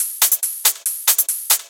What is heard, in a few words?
Loops 140 BPM Hihat Percussion Loops